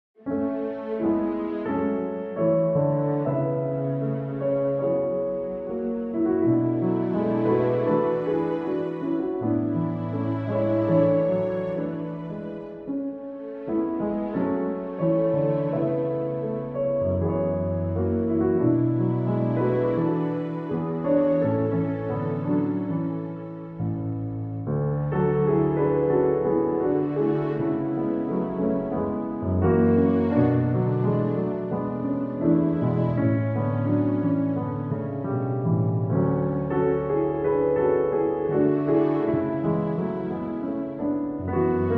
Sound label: With Strings